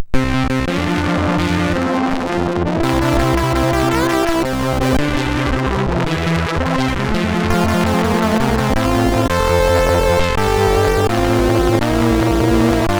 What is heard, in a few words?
Happy Birthday sid MOS6581R4